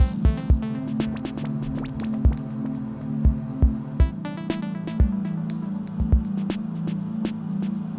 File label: vibes